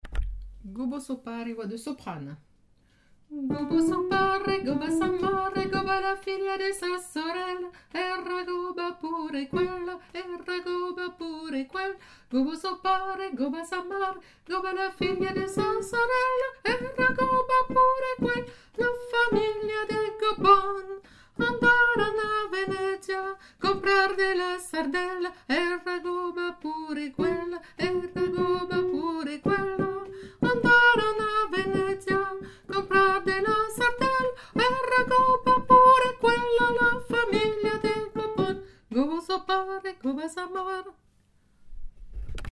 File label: soprano